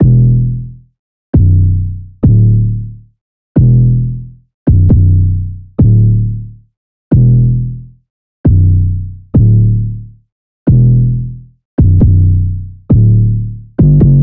808 loop 135 Bm; Slate Digital BASSHOLE Sample Pack; 808 Loops

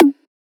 perc synth; Drum One Shots; SD MIDNIGHT Sample Pack; Slate

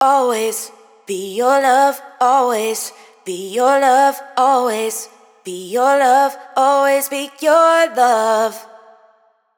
hook Always Be Your Love 110 Bm wet, Vocal; Slate Digital Omnivox Vocal Sample Pack

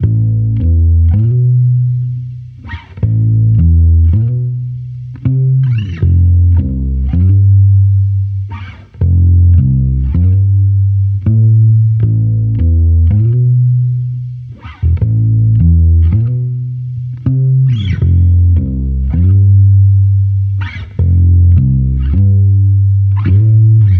Amped Loop Am 80, Electric Bass Loops; Slate Digital BASSHOLE Sample Pack

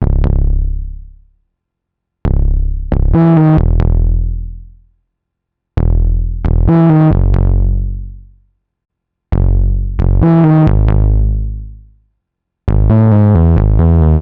Synth loop 135 C#m, Synth Bass Loops; Slate Digital BASSHOLE Sample Pack

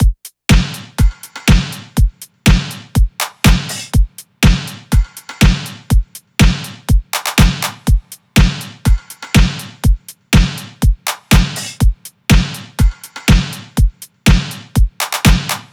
drums 122, Drum Loops, Full Drums; Starion; Slate; MIDNIGHT Sample Pack

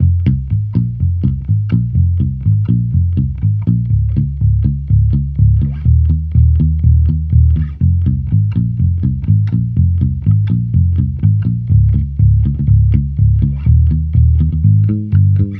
Amped loop Arppy 123 Fm, Electric Bass Loops; Slate Digital BASSHOLE Sample Pack

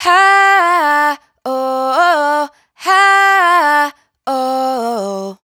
riff Ha Woah Breathy Gm, Dry Vocals; Raw Vocals; Slate Digital Omnivox Vocal Sample Pack

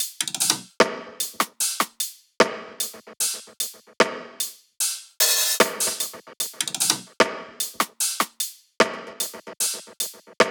top loop 75; Pop; Drums; Loops; Slate; SD PASADENA Pop Sample Pack

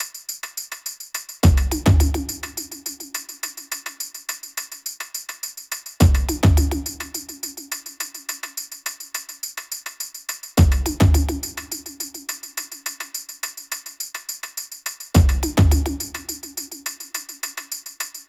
perc 105, Drum Loops; Top Loops; SD MIDNIGHT Sample Pack; Slate

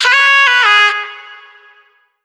phrase E, Vocal; Slate Digital Omnivox Vocal Sample Pack

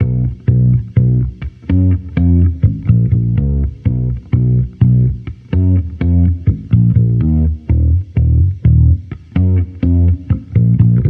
Amped loop 125 Ebm, Electric Bass Loops; Slate Digital BASSHOLE Sample Pack

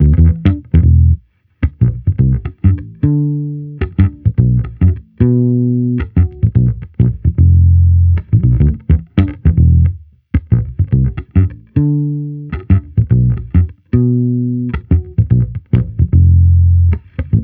Amped loop 110 Em, Electric Bass Loops; Slate Digital BASSHOLE Sample Pack

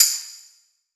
perc wet tambo; Drum One Shots; SD MIDNIGHT Sample Pack; Slate

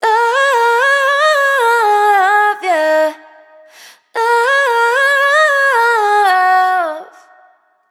hook 115 Ebm wet, Vocals; Love Ya; Slate Digital Omnivox Vocal Sample Pack

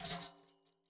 daf Single hit; Slate; SEISMIC Drum Sample Pack

